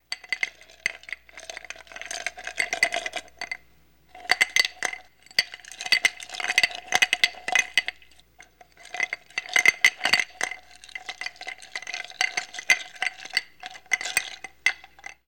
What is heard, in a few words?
Ice Cube Glass Stir